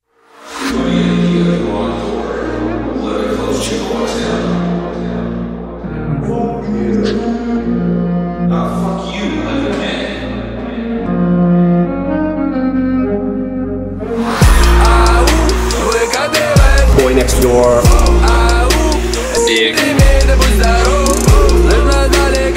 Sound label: gachi remix